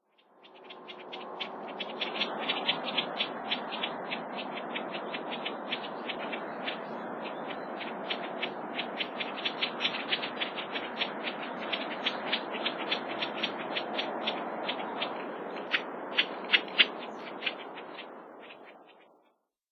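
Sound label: marsh grassbird, watarase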